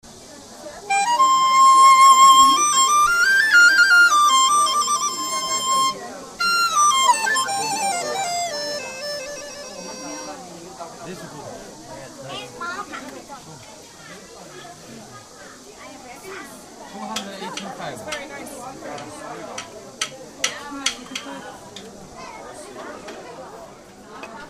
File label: Chinese flute